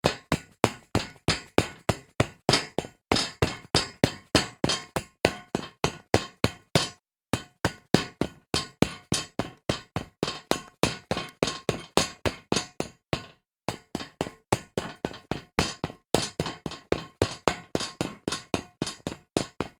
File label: running on metal grate